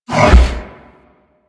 Double Spin Door Close; Orokin

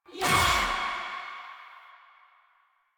Choir Erupt Proj Throw; Warframe

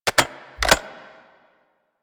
Arch Gun Alt Charge Mech, Weapons; Warframe; Archwing; Hildryn

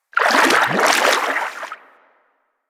Fishing Spear Fish Spook; Warframe; Weapons; Eidolon Fishing Spears

